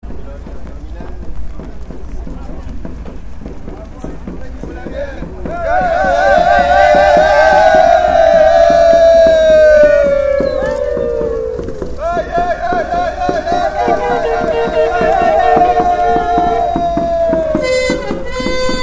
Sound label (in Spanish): marcha pueblos originarios ambiente